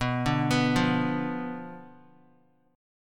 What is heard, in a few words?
B Diminished B down Guitar; arpeggiated; JGuitar